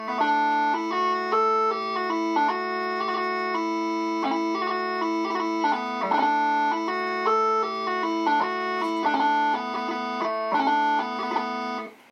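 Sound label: Highland Ladie, bagpipe tunes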